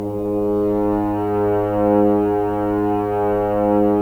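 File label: Solo French Horn, Brass; Roland - LA Composer vol. 4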